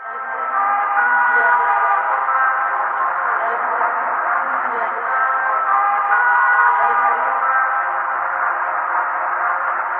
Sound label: distorted trumpets